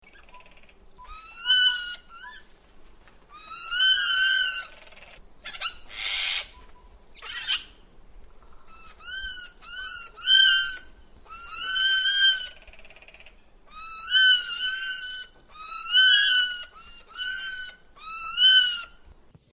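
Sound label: jay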